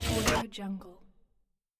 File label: scifi container